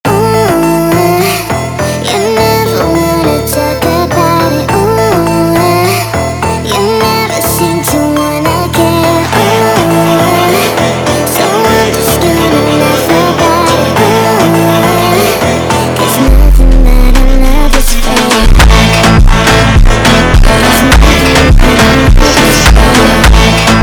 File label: Funk slowed, funk